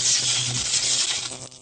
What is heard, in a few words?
laser; BALLY; CORVETTE